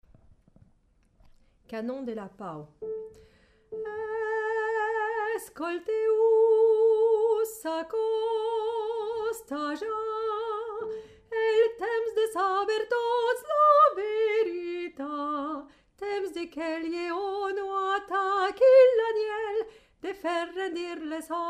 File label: canon